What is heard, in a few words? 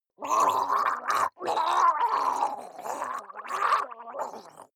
water monster female gargle angry; koerpergeraeusche; comedy-cartoon; soundpool